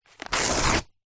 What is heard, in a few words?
household book page paper rip tear out; zapsplat